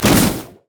blaze; LoL; brand zombie

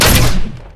tumble; LoL; vayne

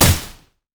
axe throw; olaf

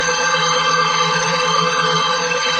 stair laser loop; odin

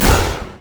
arcane barrage; LoL; ironforge xerath

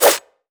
crit attack; Zed

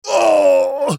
dying; Gragas; Character Voices - English

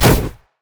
great wraith basic attack; LoL; monsters

